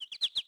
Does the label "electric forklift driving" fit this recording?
No